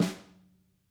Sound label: SNAR, Interactive Drum Kits; Sonic Reality; ID SNARE 1+